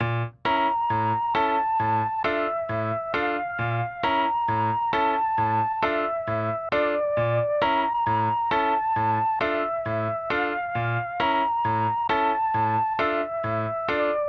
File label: Melody